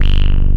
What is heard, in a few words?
ACI WAAH BS, TECHNO; Pro-Rec - Synthsonic; Z1 TECHNO